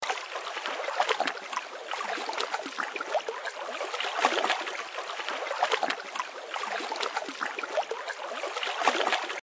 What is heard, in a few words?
loading sound